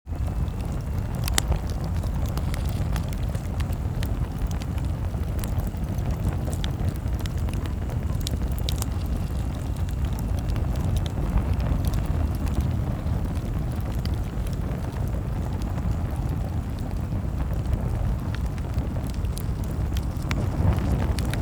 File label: Fire; Minora-Game; Sound-Artlist